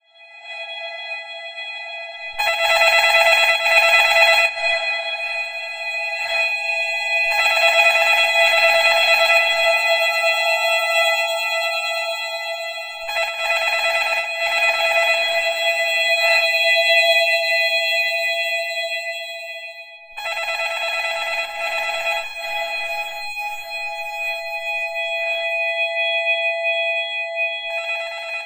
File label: tone